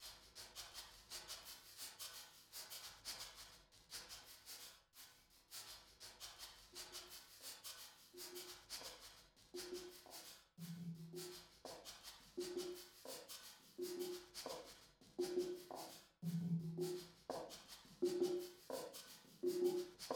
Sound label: percu